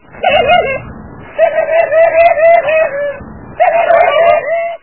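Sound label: strange sounds of pigeons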